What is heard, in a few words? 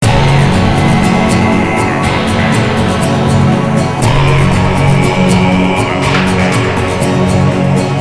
acid mix tibet; Om Bhur Bhuva